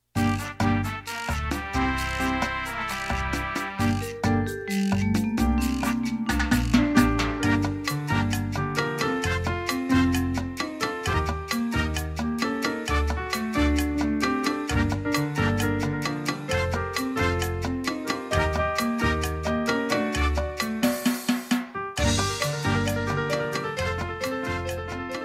Lat Jazz; ritmos; latino

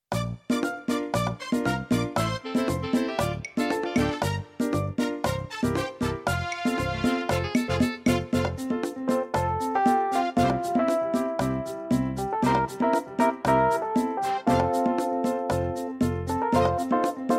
Merengue; ritmos; latino

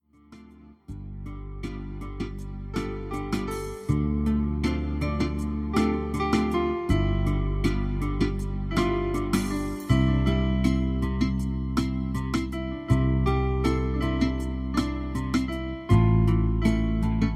Latin Balad; ritmos; latino

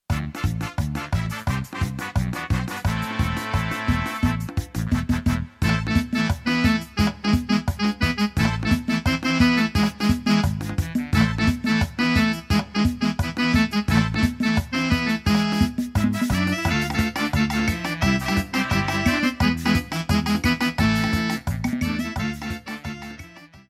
Merengue; ritmos; latino

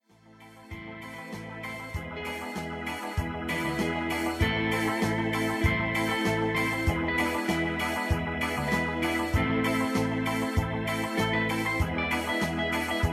Rock Mexicano; ritmos; latino

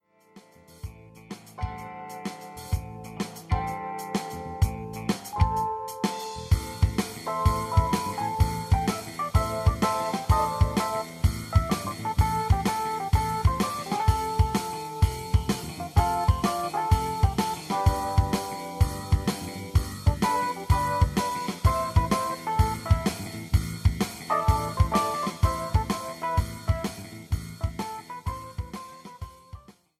Rock Mexicano; ritmos; latino

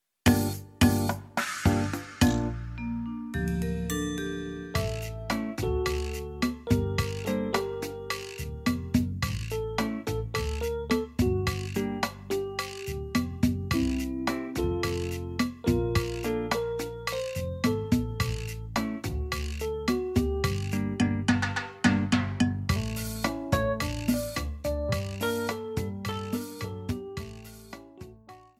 Jazz Lat; ritmos; latino

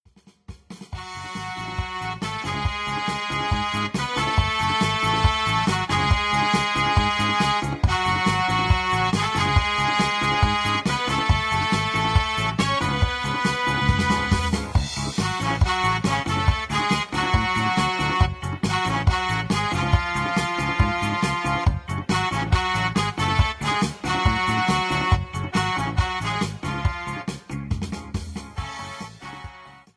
Ska; ritmos; latino

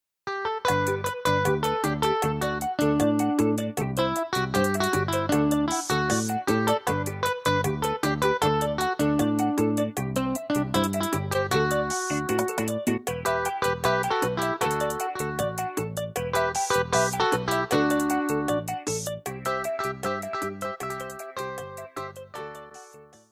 Bachatita; ritmos; latino

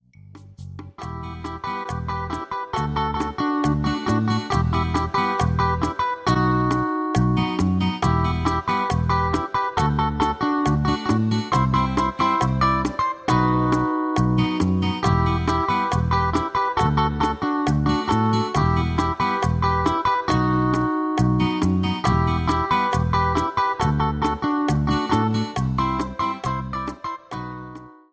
Bachata; ritmos; latino

